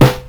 snare; PlatinumPhattCD1; E-MU Producer Series Vol. 8 – Platinum Phatt

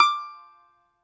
BAGLAMA D0SR; E-MU Producer Series Vol. 7 – Old World Instruments

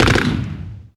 Gun Shot; Zero-G Jungle Warfare 1, 2 & 3

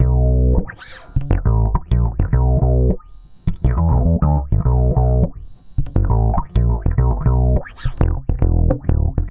103 FUNK BASS; 103 BPM FUNK B; Drumdrops; Compact Disco

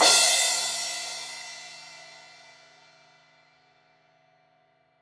Crash 16in edge, Cymbals; Deeply Sampled Drums

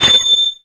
RINGER, perc; Zero-G; Funky Elements

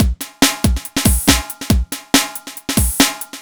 Synth Loop BPM140

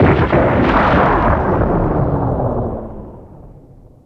EXPLOSION; Back In Time Records - Big Bang

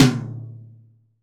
TOM M, CTD TOM

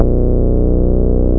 BAS Mini, BAS1SYN; Best Service Gigapack 2

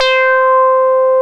MOOG LD; Greytsounds VOL-1

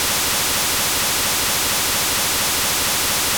kawai k1 WHITE NOISE LOOP; wavetables

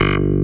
CLAVIBASS, JV 1080; Pro-Rec - Synthsonic

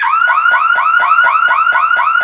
alarm, FX & ATMO, ALARMS & ALERTS

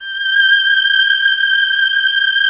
G5 hi, lush strings; InVision Interactive; Lightware VOL-1; Instruments & Percussions

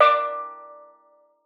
D4, STEEL DRUM; Xsample VOL-4

